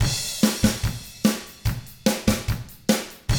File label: rock drums